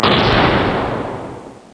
Ship explodes; Star Flick

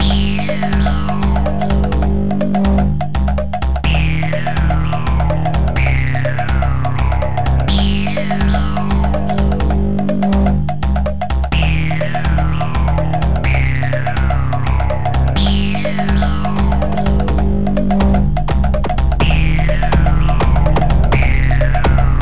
rain over messy water

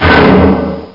METAL HIT; wreckage

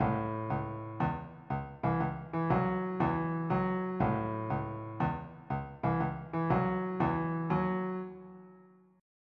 motown

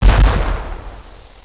canon; panzer

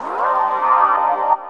SYNTH D+B 160 C, Drum+Bass, Keys; eJay

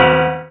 nabe shot; VirtuaTennis

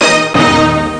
ORCH HIT; bars&pipessoundkit1

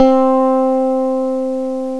Electric Guitar; Eartraining 2.3

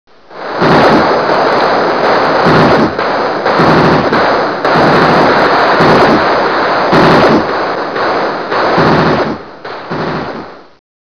GUN; Talking E-Mail

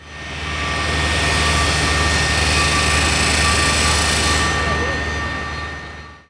SAW AMB, construction; zones

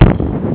letter choose; CABOL II 2.0

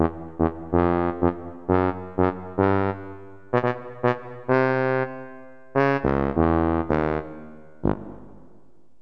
Tuba; Roland - An Invitation To The Roland World Of Music

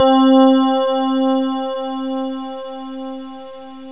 e piano; gmplay

